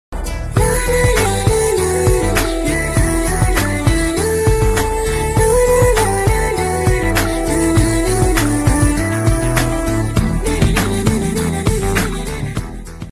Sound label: song tune